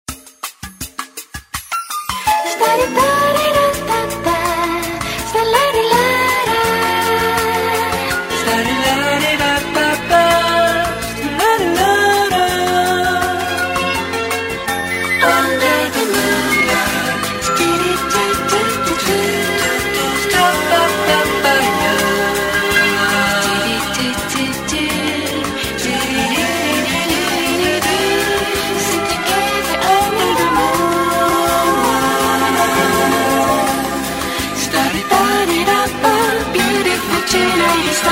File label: midi ring